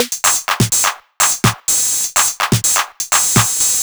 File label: drum loop jacker top 125, Classic Chicago House; Sample Magic; Drum Loops